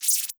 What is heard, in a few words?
Small laser; افکت صوتی